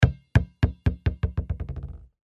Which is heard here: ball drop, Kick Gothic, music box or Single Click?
ball drop